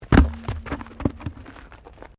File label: WRECK WHEEL STANDARD DROP, Wrecked Cars; Wrecked Cars, impacts, crashes, scrapes; Objects; Foundation4